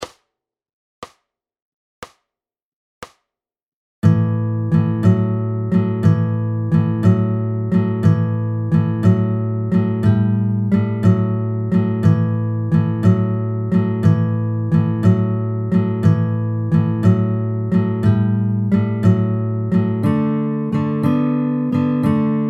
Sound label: 12 bar blues in A 60 bpm